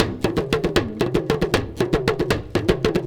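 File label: big tama groove; Best Service; World Colours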